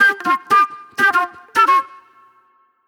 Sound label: Old Soul Flute